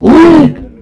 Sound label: pain, monsters; zaero; sentien